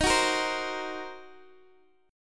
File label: Eb Minor 6th, Guitar DADGAD; Ebm6 strummed